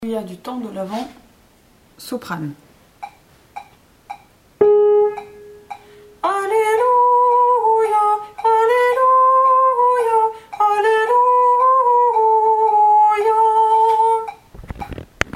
Alleluia de l'Avent SOPRANE; Gouzes

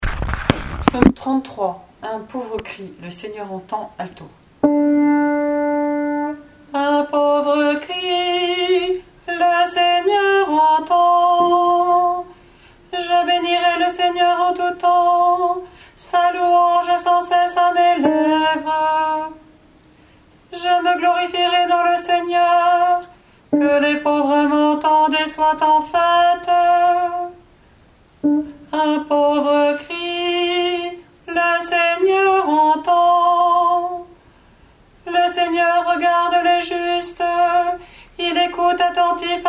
Psaume ALTO; 33 Un pauvre crie le Seigneur entend

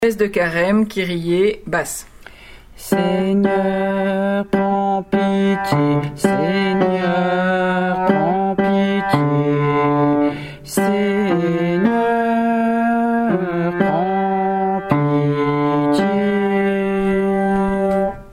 Basse; Carême Kyrié

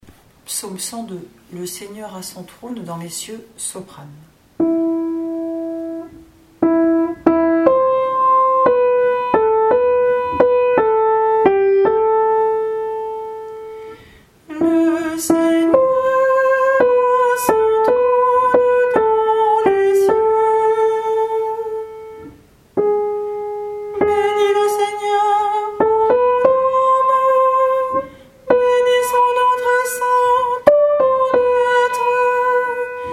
Psaume 102 Le Seigneur a son trône dans les cieux SOPRANE

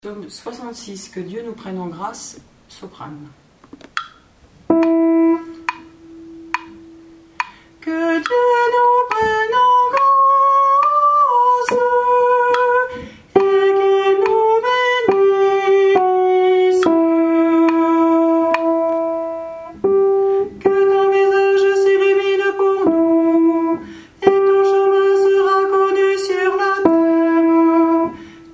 Psaume 66 Que Dieu nous prenne en grâce SOPRANE; Gouzes

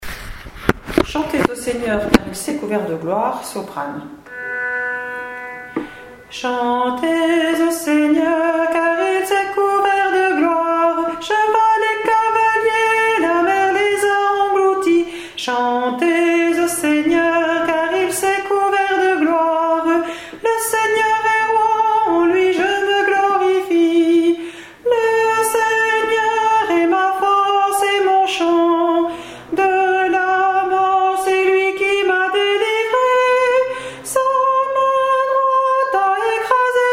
Cantique de Moïse Chantez au Seigneur car Il s'est couvert de gloire Soprano; Chemin neuf

